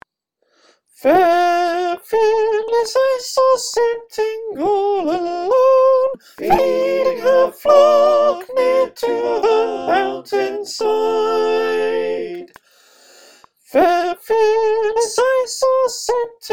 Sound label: sop, choir